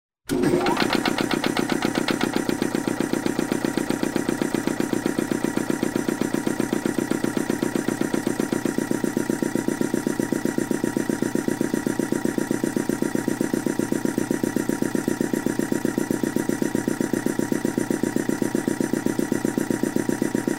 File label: traffic